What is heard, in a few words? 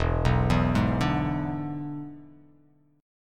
E 7th E down